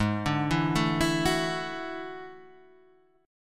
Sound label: E 11th Ab